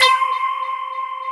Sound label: BLEEP, HOUSE